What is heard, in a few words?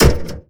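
ladder metal, player; quakemapping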